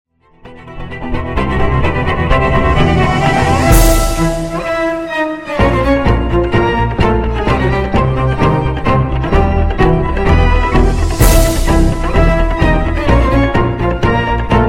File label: huawei y7 ringtone